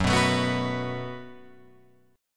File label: Gb Minor Double Flat 5th, Guitar, Open F; Gbmbb5 strummed; 6,6,9,6,9,6; Gbmbb5 chord